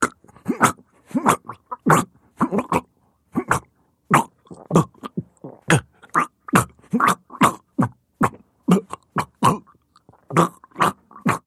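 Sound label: throat cutting sounds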